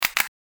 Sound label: s g17 switch, weapons